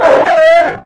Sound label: hunter defend strider